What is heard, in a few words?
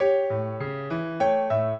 trio, piano; Mozart